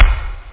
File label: kick; kicks